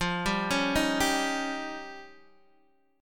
F 7th Suspended 2nd Sharp 5th F Guitar Open D

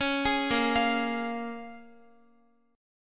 A sharp Minor 7th, A sharp, down, Guitar; A#m7; arpeggiated

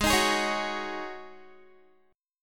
Ab 7th Suspended 4th Ab down Guitar

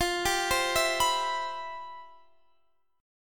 C Major 7th F; arpeggiated; Cmaj7/F

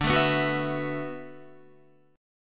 D 6th Add 9th, Guitar; strummed; 10,12,9,11,11,11; 4E,4A,5D,5G,5C,6F; chord

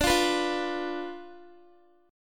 D Minor 6th Guitar; Dm6 strummed

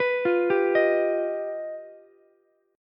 G Augmented 7th, Ukulele; G+7 arpeggiated; 16,17,15,18; JGuitar

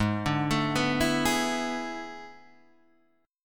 A Major 7th Suspended 2nd Suspended 4th Ab

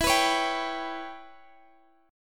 E Minor 6th E; Em6; strummed; x,x,14,16,14,15

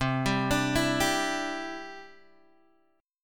C-Major Add 9th, Guitar Open G

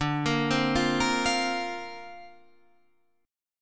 D-Minor 7th Sharp 5th D, chord

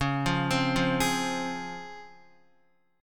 C sharp Major 7th C sharp, Guitar; arpeggiated; chord; x,4,5,5,5,4

